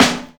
focused acoustic snare b key, acoustic snare tuned to the B note